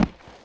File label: BODY THUD